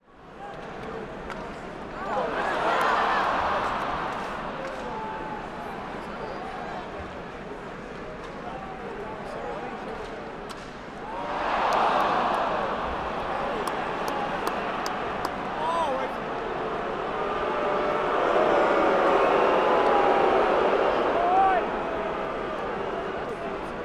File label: Crowd Large Outrage Then Booing Reaction Hockey Game